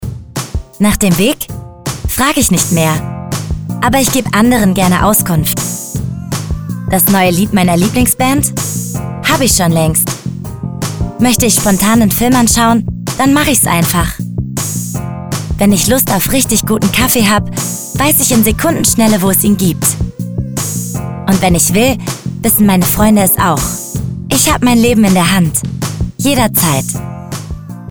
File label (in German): Mobilmusik